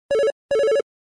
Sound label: Simple Beep; LG MX100; ringtone